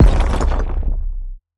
Crystal Up; Game rip; MiSide

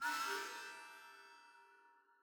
Ring Use; MiSide

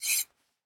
Take Knife; Game rip; MiSide

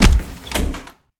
Door Kick; Game rip; MiSide

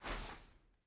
Glass Retake; Game rip; MiSide

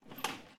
Scarf Open; MiSide

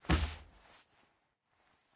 Player Fall; MiSide

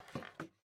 Air Drop; Game rip; MiSide

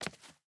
Step Heel Marble; Game rip; MiSide

